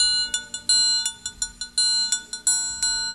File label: TRIANG; East West